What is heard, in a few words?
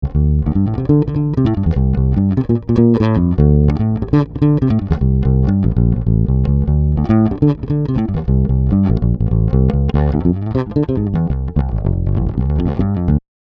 Tierra Audio Gravity VCA Bass dry